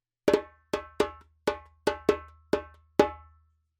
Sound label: djembe; djembebog oevelse